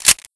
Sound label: fiveseven slide release; czero opforce